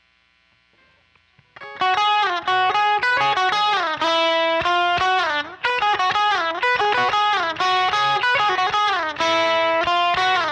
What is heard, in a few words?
quilter solo